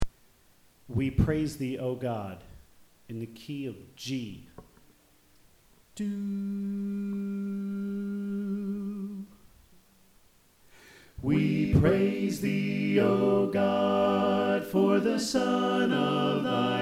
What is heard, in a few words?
Tenor Left